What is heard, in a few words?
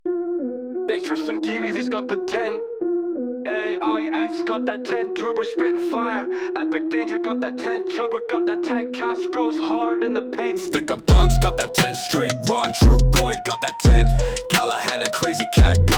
rap